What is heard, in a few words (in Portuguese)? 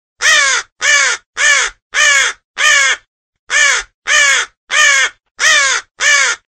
som de corvo